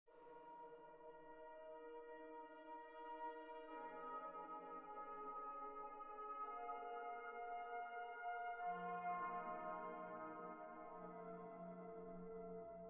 oboes

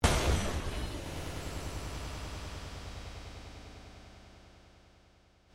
explosion

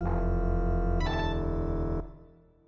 ensemble

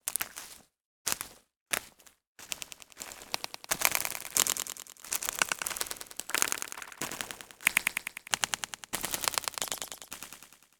forest

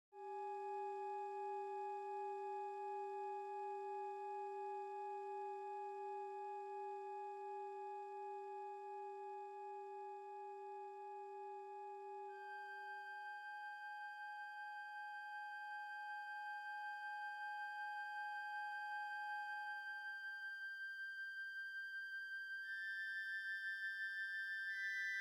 hum

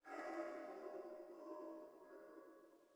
bark

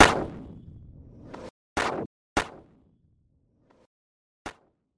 knock

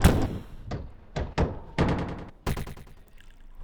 bang

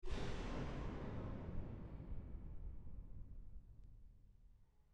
explosion

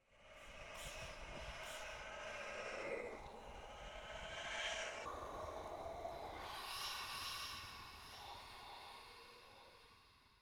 waves

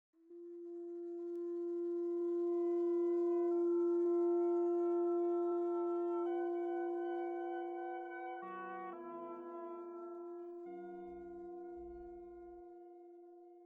oboe

